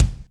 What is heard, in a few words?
BD Metal, Yamaha DTXpress Acoustic Kick